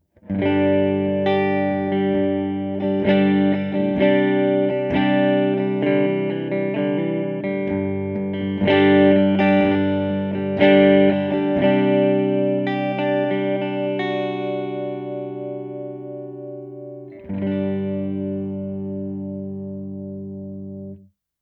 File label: clean